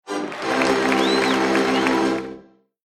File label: delighted